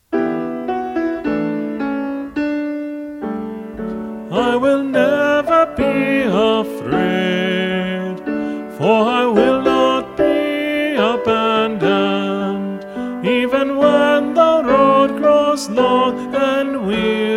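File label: All Parts, Choir Practice Tracks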